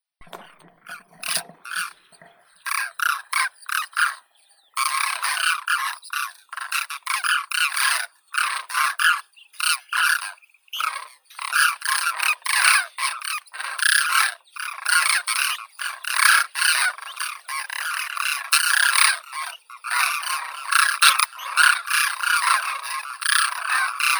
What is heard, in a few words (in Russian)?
zhuravl malii krasavka anthropoides virgo; zvuki prirodi